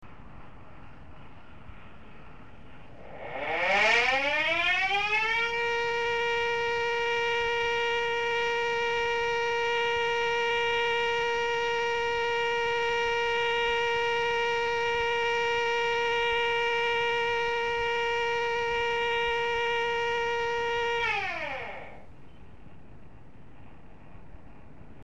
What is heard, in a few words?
80hz, siren sound